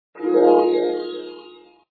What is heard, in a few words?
Snowman Grow; sumo snowman